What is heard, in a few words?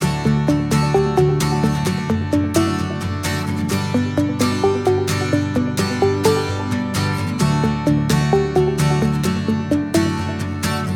ringtones; Euphrates; Essential; PH-1